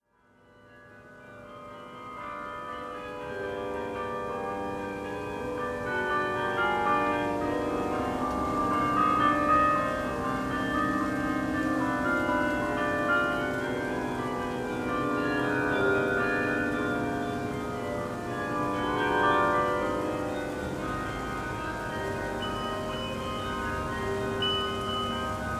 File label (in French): carillon pour la planete